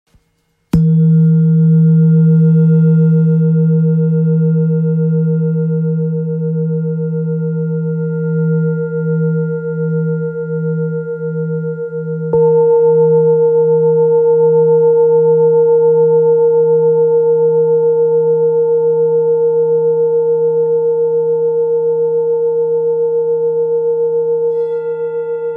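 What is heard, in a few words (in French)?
Bol therapeute re diese, bol chantant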